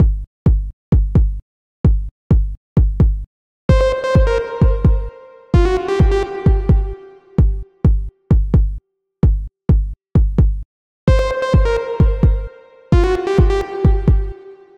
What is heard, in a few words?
menu loop